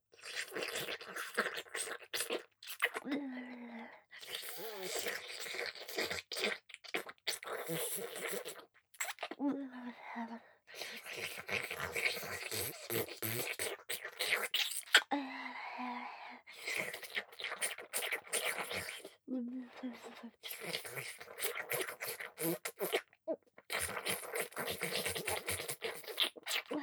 乳首舐め(激しめ); 乳首舐めループ

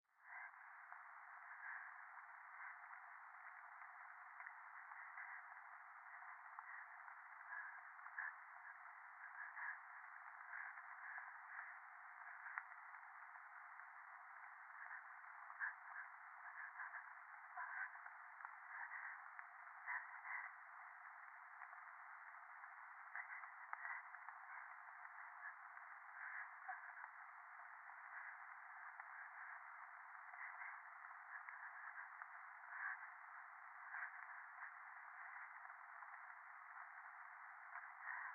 電話 右耳 快感 吐息 雨

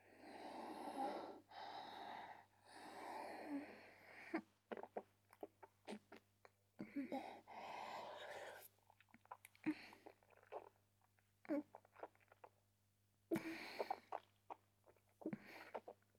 フェラチオ; SEなし

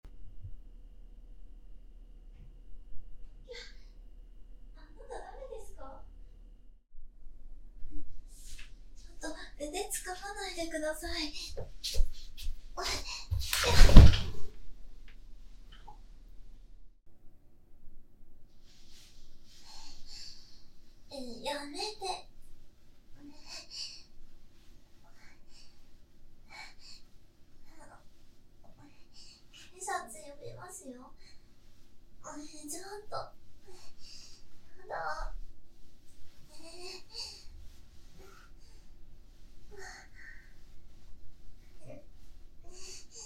自宅リビングで襲われる本番H 遠方固定マイク

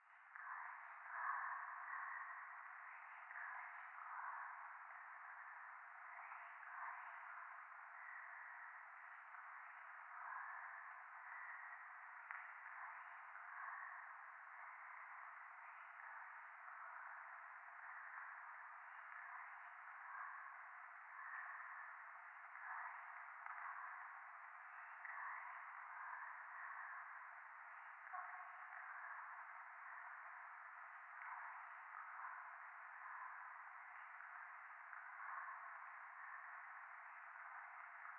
廃墟 しこしこ 雨

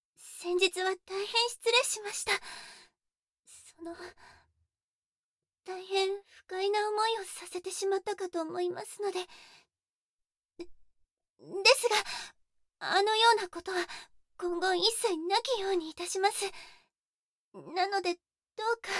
フェラチオ, ASMR

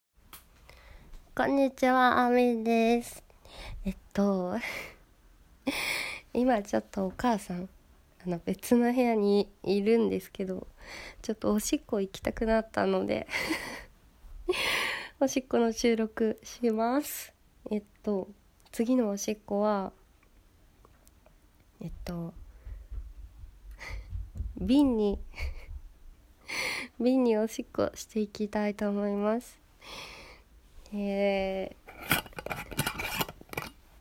空き瓶におしっこ

